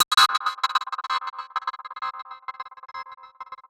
Gated Cymbal; musicradar

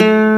ACGUIT D2, BassGuitar; AKAI S6000 CD-ROM - Volume 1

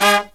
LOW HIT, Brass

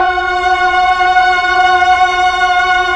PAD, Melo. Str+Vox, Slow Str+Vox; Roland LCDP09 Keys of the 60s and 70s 1

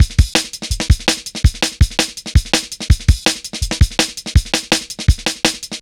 165, Drum Loops, Drum & Bass; Total Drum&Bass

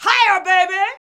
VOX, Singin; Sound & Vision - Gigapack II CD 2

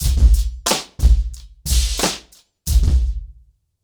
90BPM, acoustic drums; The Roots Drumloops

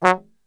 F#1, brass; Best Service; Brass Super Section; TRMB SHOT; 90 s Sample CDs

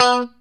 POP B3, STRAT guitar; Spectrasonics; Hans Zimmer Guitars VOL-1; JC-STRAT POP

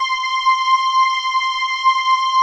BELL LIGHTS, JV PADS; Pro-Rec - Synthsonic

